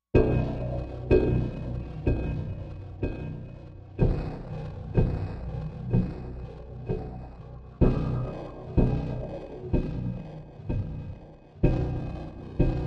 Drive in Drama, Machine, Rhythmic Beat, Metallic, Wind, Xylophone; Sci-Fi Space